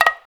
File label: AFRO, African Perc; Spectrasonics; Supreme Beats; African Contemporary; SB Afro DRMs